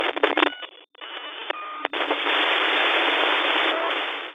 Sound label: System Sweep